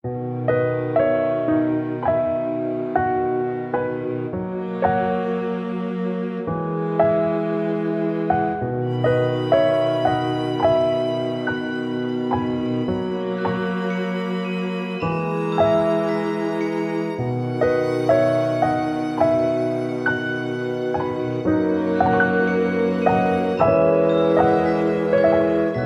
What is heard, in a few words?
orchestra; rugrats